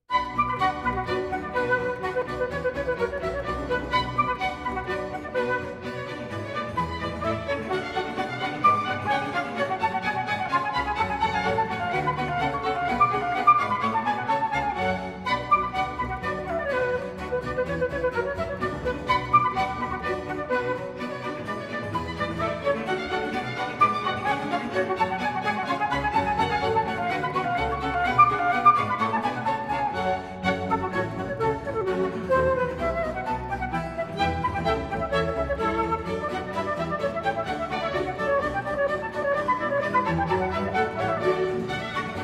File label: Overture (Suite) in B Minor, Badinerie; Overture (Suite) No. 2 in B Minor, BWV 1067